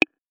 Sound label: click; Family Cheaters